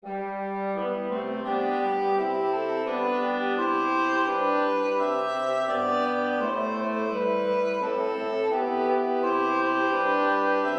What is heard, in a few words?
tutti